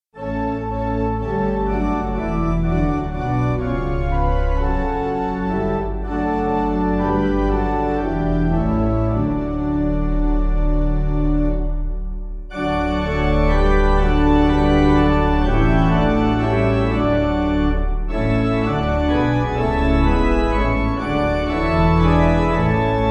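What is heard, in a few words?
pipe organ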